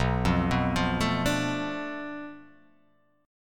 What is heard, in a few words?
B Minor 7th Flat 5th B, chord; Bm7b5; arpeggiated; JGuitar